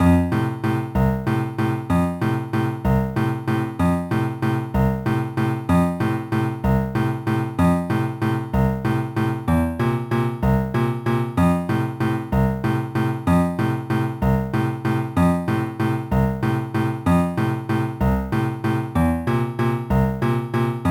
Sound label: BGM PIANO